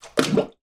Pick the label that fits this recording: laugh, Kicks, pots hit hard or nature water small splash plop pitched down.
nature water small splash plop pitched down